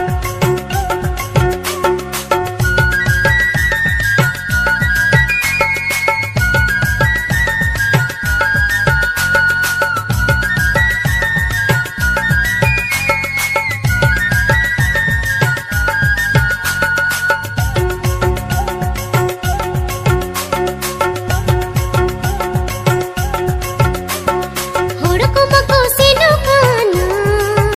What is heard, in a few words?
santali cartoon ringtone